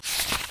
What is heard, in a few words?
turn page; uboa rampage ii